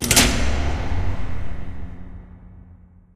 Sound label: light power on; Source Engine; Portal 2